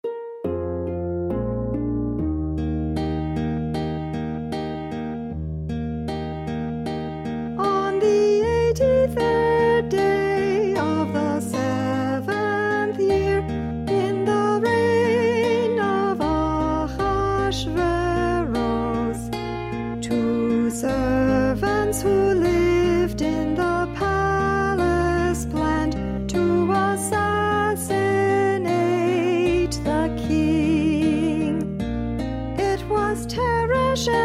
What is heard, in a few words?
Alto Audition; 2017 Springtime for Haman